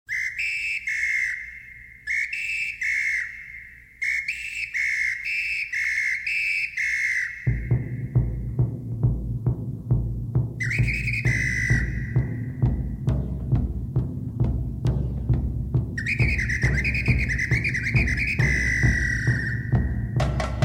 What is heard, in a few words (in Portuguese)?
Apito No Embalo (The Carnival Whistle)